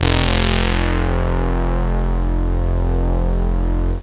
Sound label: Synth Brass F1; Star Trek JR Audio